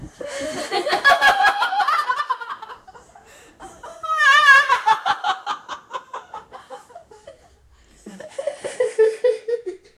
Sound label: son and mother laughing; family